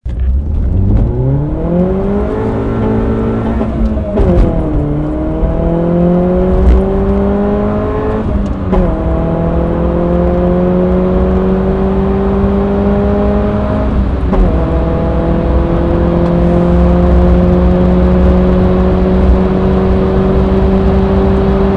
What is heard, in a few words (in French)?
depart arrete micro a l arriere derapage a 31 secondes